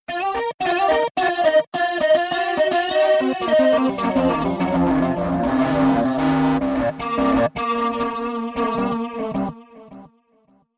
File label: distorted perception